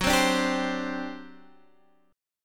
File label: G Major 7th Suspended 4th, F sharp